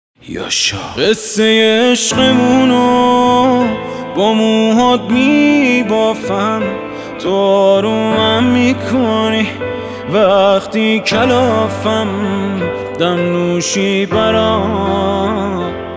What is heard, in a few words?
Piano Version